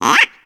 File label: Bumper car quack